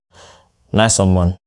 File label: match; tetris attack; fertig classic games